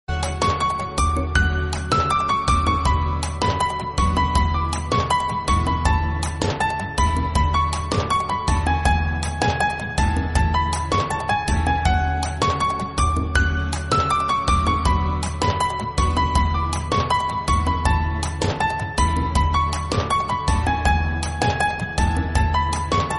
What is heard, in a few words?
Romantic Music Tone, Hindi, Only Music Tone